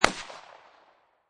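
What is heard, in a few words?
Pistol Smith & Wesson P99 40 Cal Single Shot Exterior At 45'; Gun / Pistol: Smith & Wesson P99, 40 Caliber, Single shot, Exterior, at 45 feet distance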